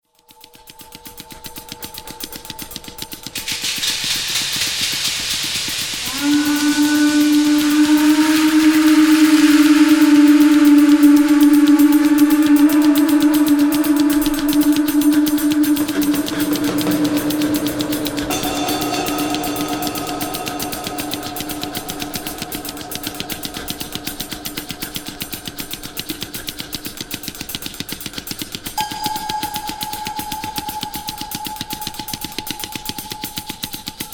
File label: angklung new percussion